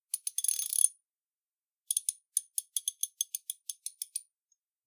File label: socket wrench, Hand Tools; Cfry